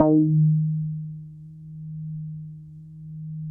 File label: ROLAND JX8P, Vintage Keyboards, EB3; Big Fish Audio - First Call Vintage Keyboards